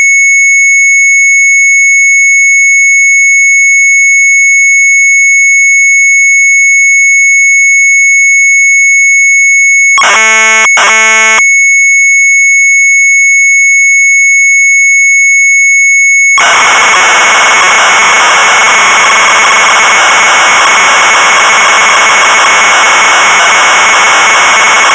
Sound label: MZ800 Tape, sharp; TANKPATROL; retromelsarchive